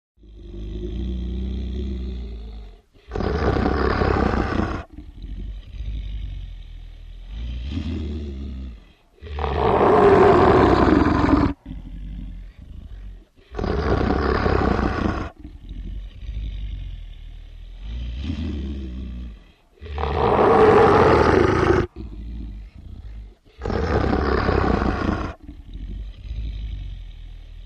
Werewolf Growls Inha

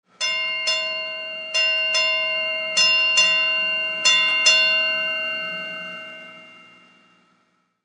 Marine Clock Ring

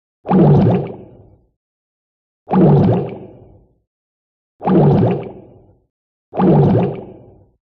Bubbles Short Bursts

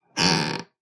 cabinet door screech; cabinets

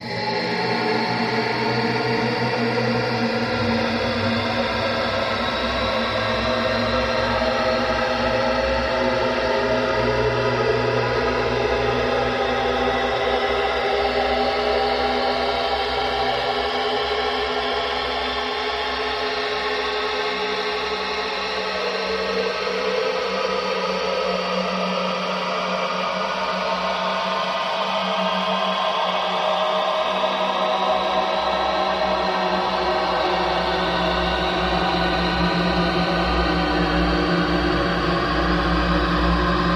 Vocal Scare Vocal Effect, eerie

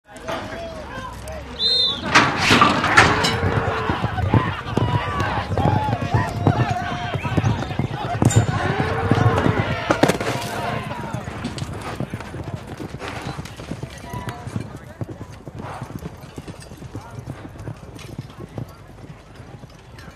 Rodeo Bronco Ride; bull ride

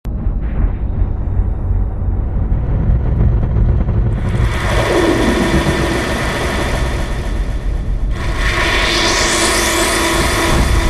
Liquid Methane Low Thun

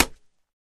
Boxing Glove Hit Slap

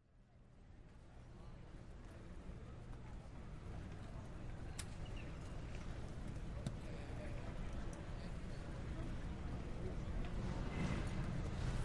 Xray Machine Airport; X-ray Machine In Airport, Walla, Luggage Impacts, Foreign Male Walla, Distant P.a.; Airport, Customs

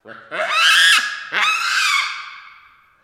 Baboon Shrieks

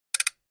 Flash Gear Triple

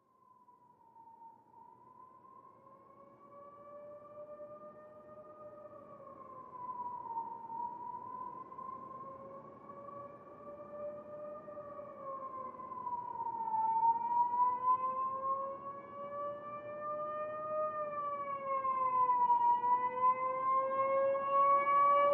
Siren Slow Police By

